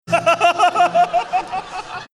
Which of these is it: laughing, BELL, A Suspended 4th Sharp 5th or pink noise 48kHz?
laughing